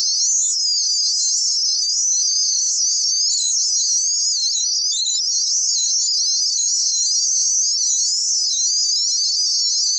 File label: planet